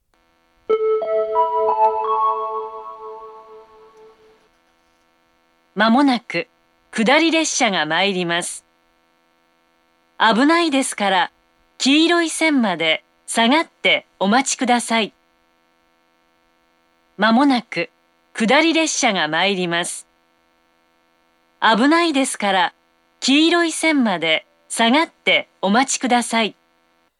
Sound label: hirono, station; jr-east